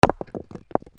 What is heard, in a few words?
FALLING